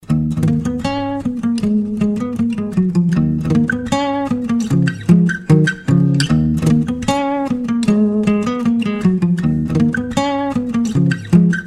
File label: instrumental